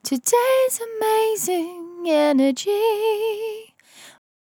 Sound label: On A Star 65bpm F, Vocal; Zero-G